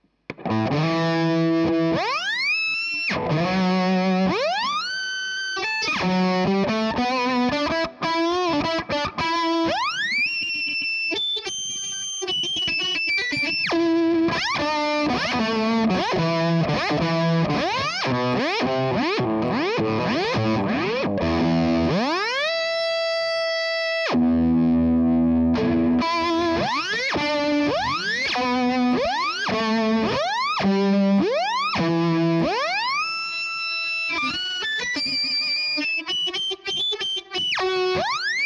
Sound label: whammy